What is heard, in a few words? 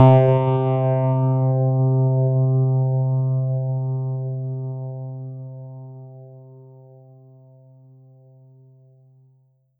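Ensoniq ZR-76 Vintage Wurlie C3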